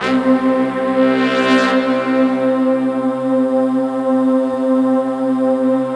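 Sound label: BRASS, Slow Brass Choir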